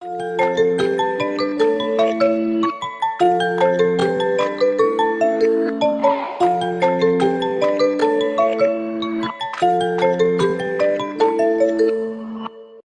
Samsung Galaxy A05S

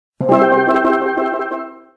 Samsung Notification

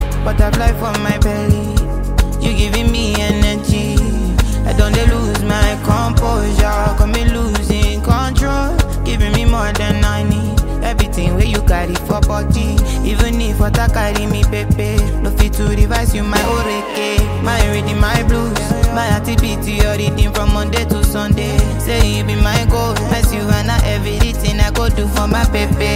Rhythm Blues

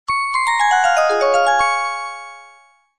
Best Samsung Notification

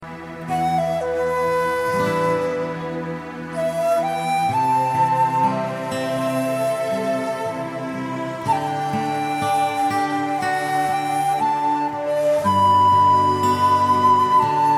Nice Flute

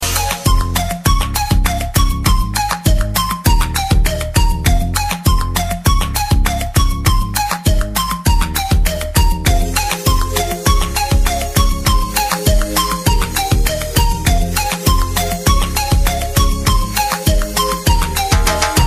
flute

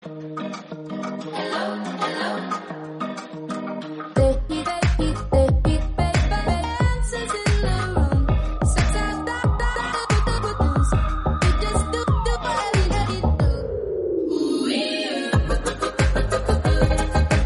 iPhone 12 Mini

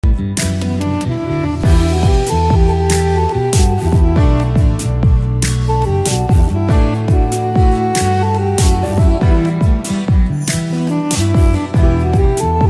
Lala Arabic

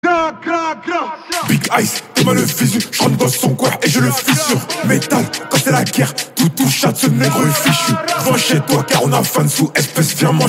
drill fr

